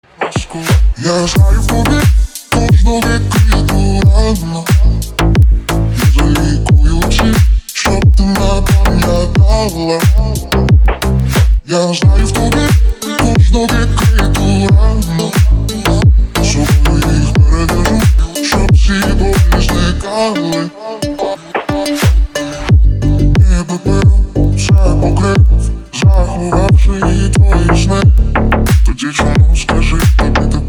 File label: remix